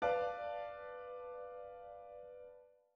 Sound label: B half diminished